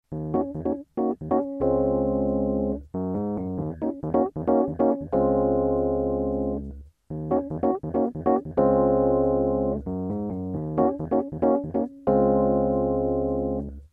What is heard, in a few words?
mark1b stage mellow, fenderrhodes